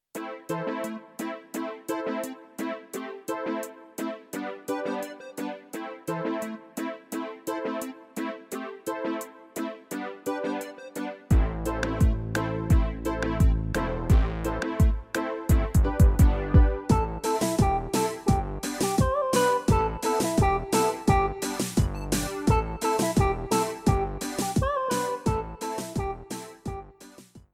Reggaeton, ritmos

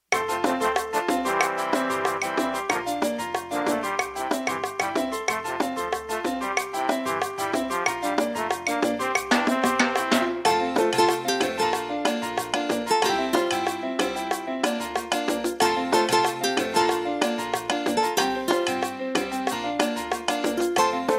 Salsa

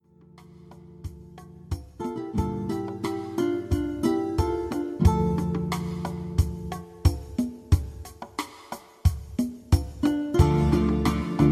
Balada Mariachi

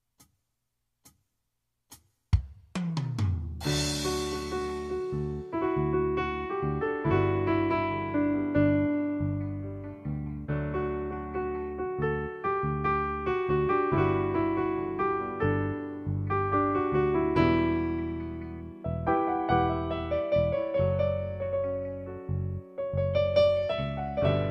Balada Latina, latino; ritmos

